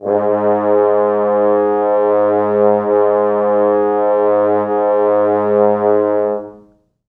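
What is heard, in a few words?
4 French Horns sustain; East West; Quantum Leap Horns Sax; 4 FrHorns SUS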